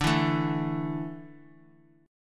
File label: D Suspended 2nd D down